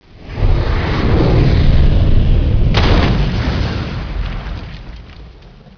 rocket

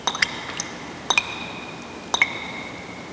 WATER DROP; tortuga

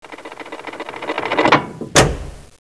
switch reset; dm clocktower

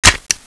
mg34 mag out; svencoop; ww2projekt

